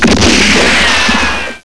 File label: winchester; gunslinger